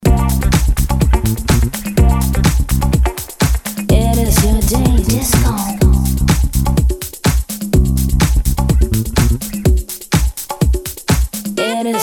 Disco Original Mix

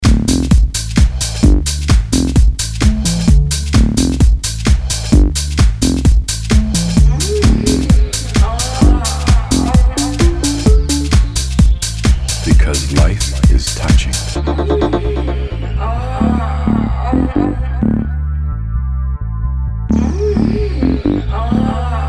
Dub Mix

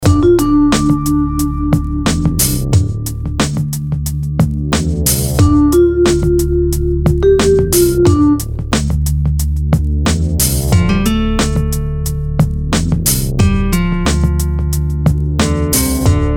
Why guitar

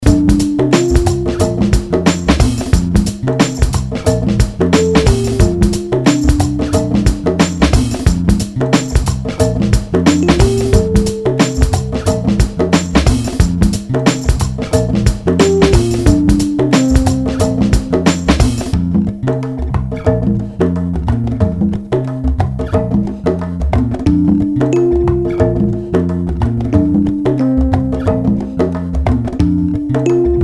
Latin Vibe

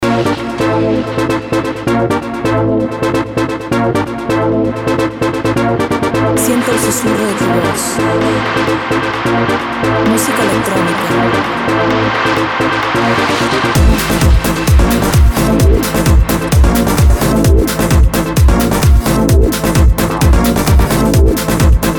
Electro Musica Electronica

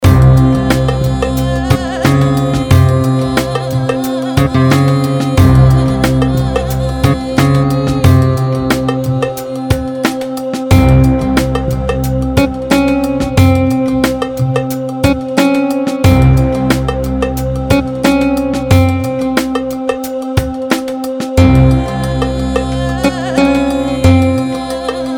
Oriental

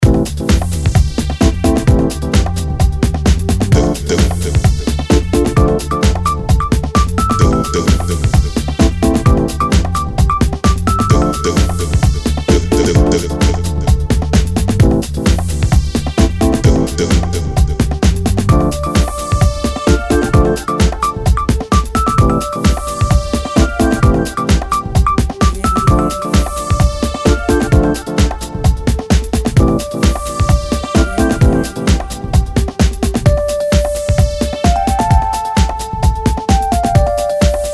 Dub Mix

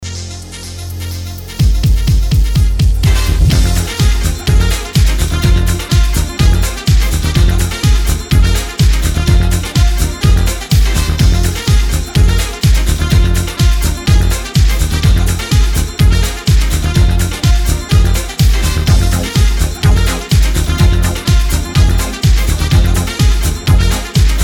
Samba Latin mix

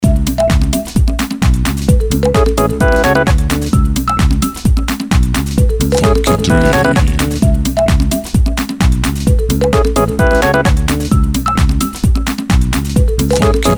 Funky

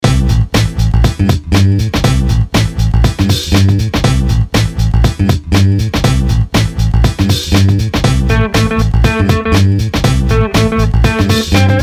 I love your guitar

